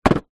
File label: sport skateboard down gently